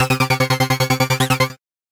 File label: Rye Catcher, Wavetable, Synth Rhythmic; Instruments; Ableton; Factory Packs; Synth Essentials